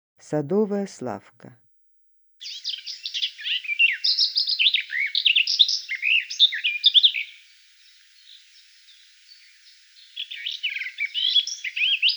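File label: garden warbler voice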